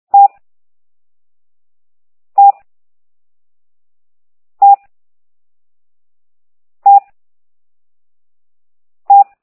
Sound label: eeeee